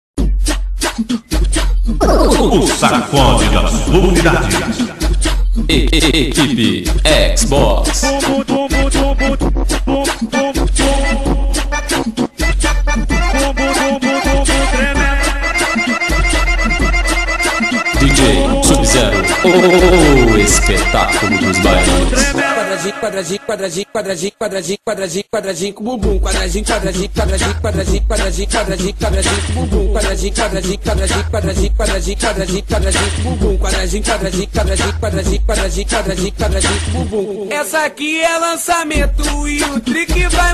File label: funk